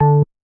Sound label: bass, programmable speaker